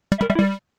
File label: call sound